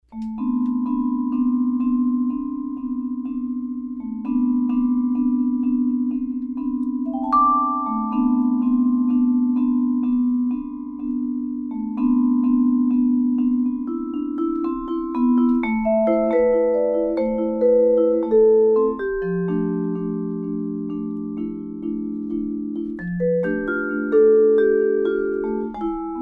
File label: solo